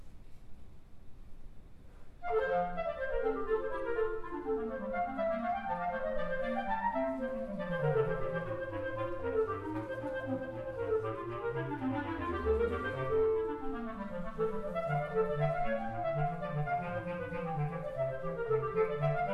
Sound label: Courante; French Suite No. 5